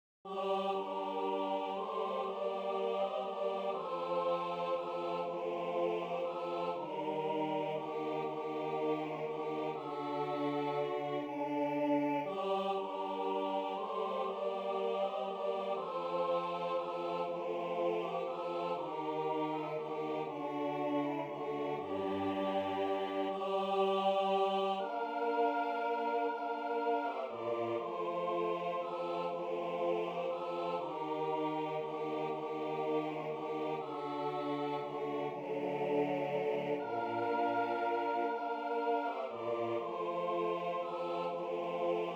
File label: What child is this SATB